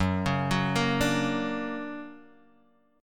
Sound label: D Augmented Major 7th, chord